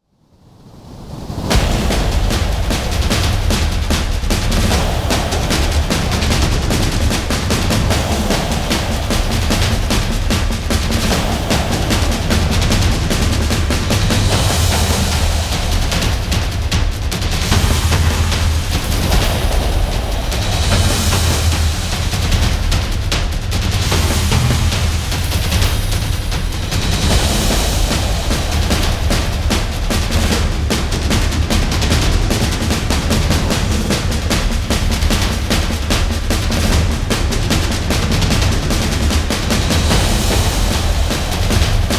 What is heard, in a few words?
full war drum blast; Sword of the Guardian